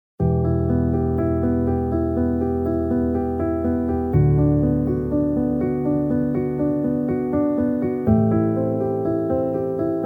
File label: Piano Version